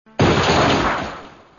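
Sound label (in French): texture atterrissage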